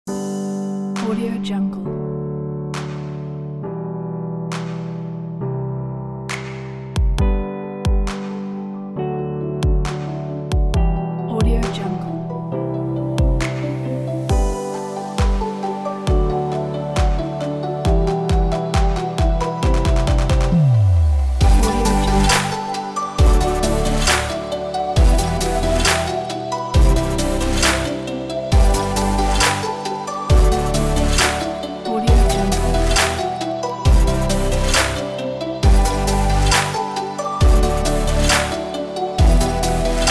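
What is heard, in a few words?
background upbeat music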